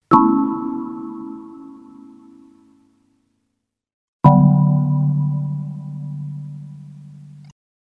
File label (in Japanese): kaidan up